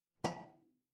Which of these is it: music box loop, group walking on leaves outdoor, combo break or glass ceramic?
glass ceramic